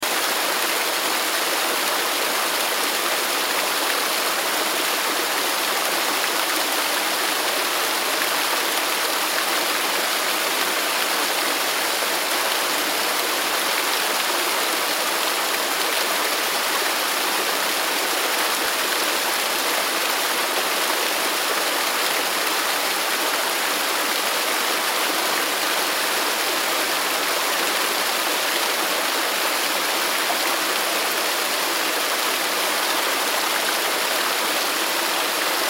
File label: Waterfall, Waterfall Loop; Water (Sound Effects:Nature); Blastwave